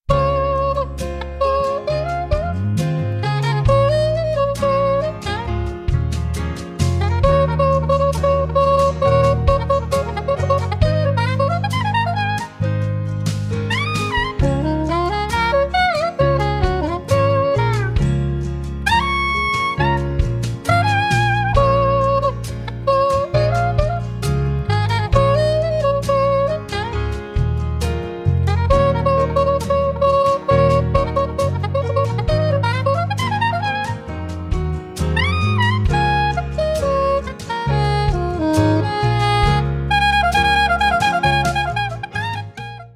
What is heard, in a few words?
Jazz Lounge